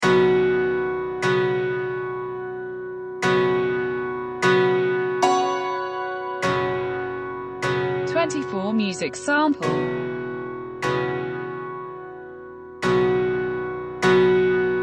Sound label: moonlight hackbrett sample